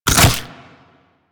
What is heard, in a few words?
Nunchuck Unequip, Weapons; Warframe; Infested